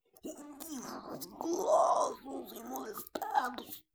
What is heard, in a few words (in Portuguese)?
risos gulosos